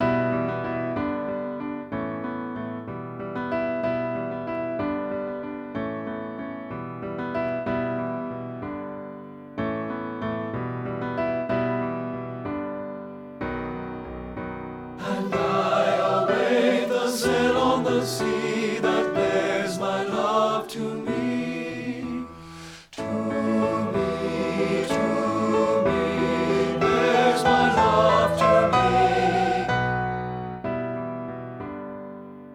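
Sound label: THE VOYAGE TENOR; partbypart; satb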